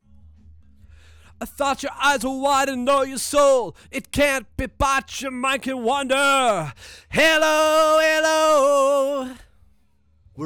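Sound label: vocal; Pro Tools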